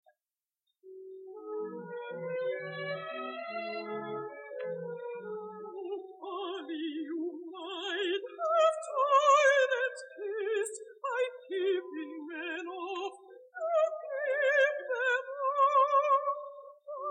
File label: air